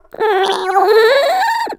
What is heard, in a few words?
Transform; Mother Maiamai transforming an item; zelda